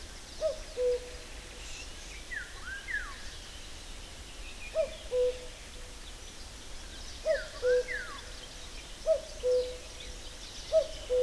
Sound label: Cuculus canorus male